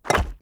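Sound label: symbol Drop; netent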